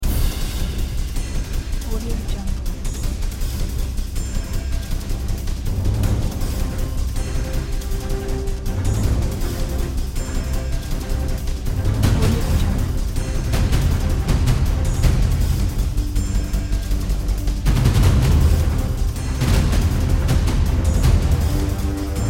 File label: battle of demons